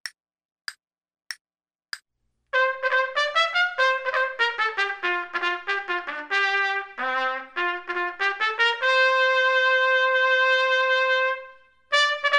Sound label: trumpet; Selected Duets for Trumpet Podcast